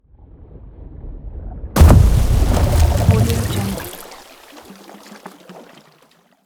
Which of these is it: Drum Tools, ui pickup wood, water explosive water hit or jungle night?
water explosive water hit